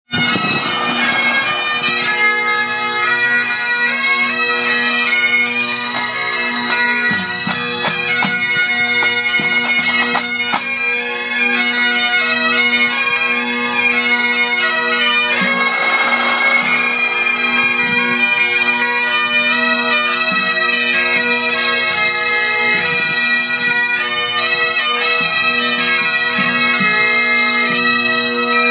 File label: highland gathering corby pipes